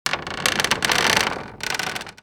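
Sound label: wood creak shipwreck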